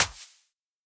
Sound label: Clap Snare